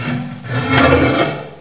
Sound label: SCRAPE; sound effect